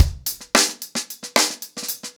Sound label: Hip Hop 110BPM, acoustic drums drumloops